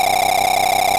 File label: Drift; puchicon; dsi ds